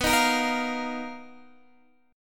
B-Major Sharp 11th B down Guitar Open D

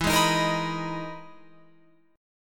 E-Major Sharp 11th E, Guitar Open F; EM#11 strummed

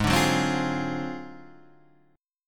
D 7th Sharp 9th Flat 5th G sharp

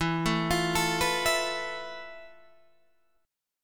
F Major 7th Flat 5th E, guitar chord

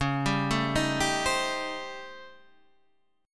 A 7th Sharp 9th Flat 5th

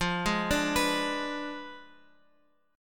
F Diminished 7th F down Guitar Open F; Fdim7 arpeggiated; JGuitar

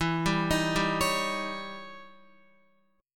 E Minor Major 13th E down Guitar; EmM13 arpeggiated

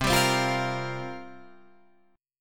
C 7th Suspended 4th, Guitar Drop D, chord

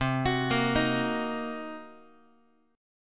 C Minor 7th Flat 5th Guitar, chord; Cm7b5 arpeggiated; JGuitar

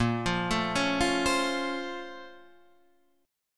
A sharp Minor Major 9th; arpeggiated; 6,8,7,6,6,8; JGuitar

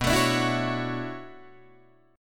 B Minor 11th B down Guitar DADGAD; strummed

